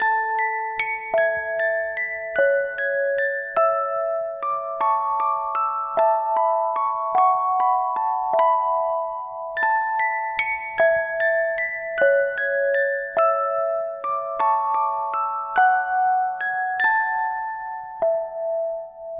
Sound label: Nighty Night Loop; Babysitting Mania; nds